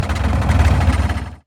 build bikes

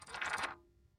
year advance

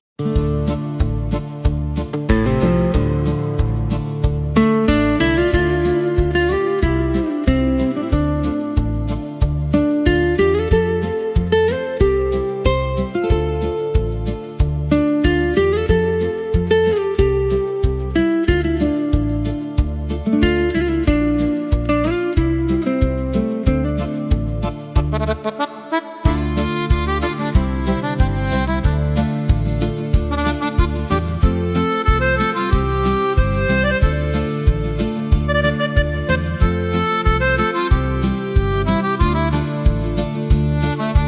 untitled instrumental

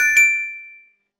hit perfect

